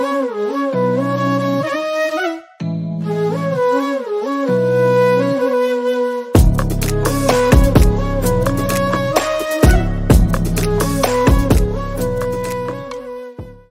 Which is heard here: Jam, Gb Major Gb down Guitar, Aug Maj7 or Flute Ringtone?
Flute Ringtone